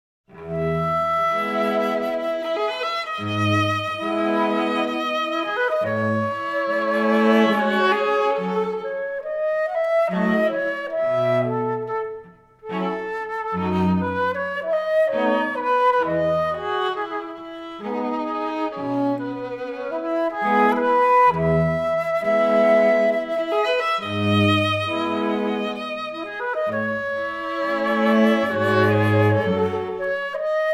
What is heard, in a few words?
Musettas Waltz